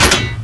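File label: METAL HIT; THEXDER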